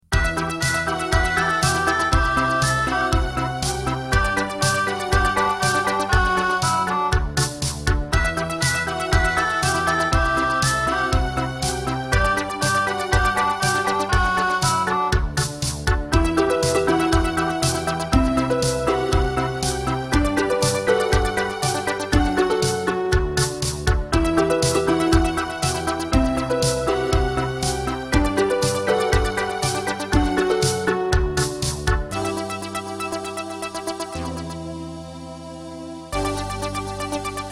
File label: yamaha tg100 musique promotionnelle; nokia